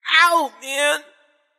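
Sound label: nonverbal ouch; smite; voicepack; merlin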